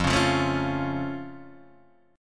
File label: F Augmented Guitar Open D; 3,4,3,3,4,3